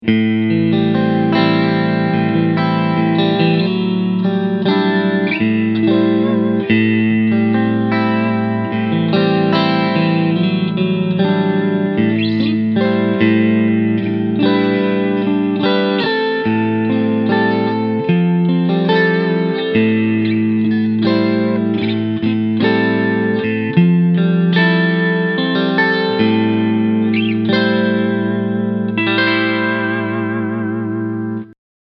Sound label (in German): Hornet 30i Beispiel; Mooer